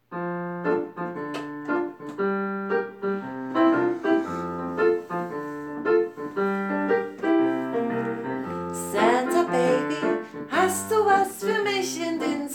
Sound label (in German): deutsch klavier